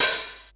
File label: hihat open